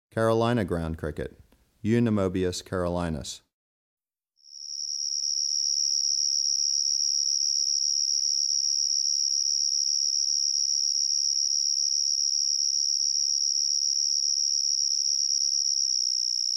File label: Carolina Ground Cricket, Eunemobius carolinus; Crickets and Katydids